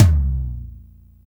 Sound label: TRUETOM LO; Drums In Your FACE!